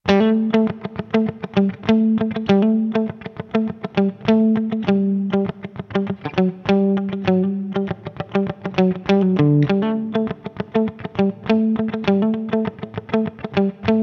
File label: Picky Guitar Dry